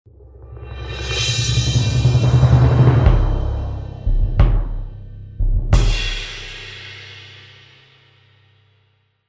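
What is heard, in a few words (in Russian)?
барабанная отбивка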